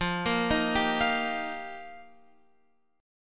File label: F 7th Suspended 2nd Suspended 4th, Guitar Open F; F7sus2sus4; arpeggiated; x,12,10,10,10,12